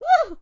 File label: Cat launch grunt catch fall